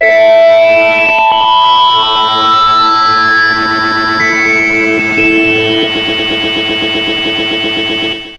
guitar driller; Zaps and Beeps

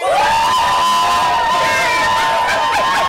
"woo" group, voice

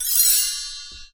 winner, Bells

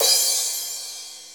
whispered, cymbals

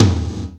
Toms; Real

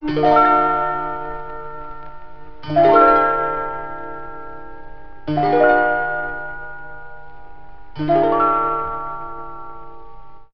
keys magical atmospher

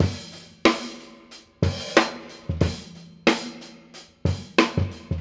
Drum loops, Boombap

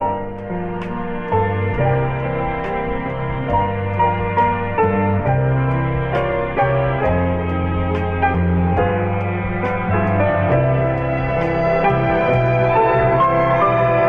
piano soft, Phonky loops

